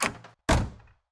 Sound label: door; DanisRace